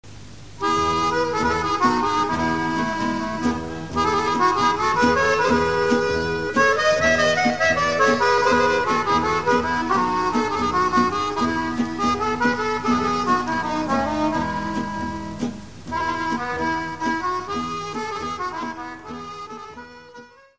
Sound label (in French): Sirto, Bulgarie